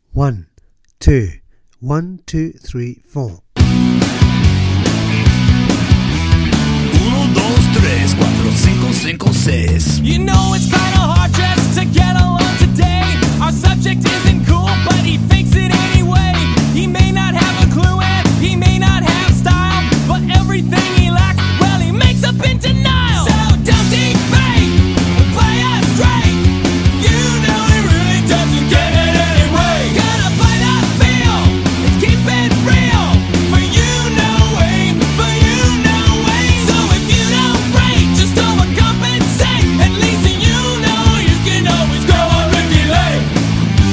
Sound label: bass